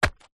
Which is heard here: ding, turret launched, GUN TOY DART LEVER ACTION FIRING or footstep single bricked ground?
footstep single bricked ground